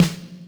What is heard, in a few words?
snaredrum, Yamaha RM 50